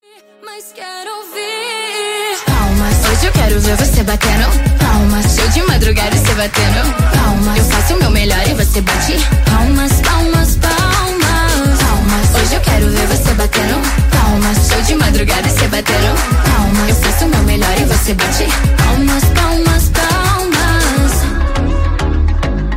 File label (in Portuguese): PALMAS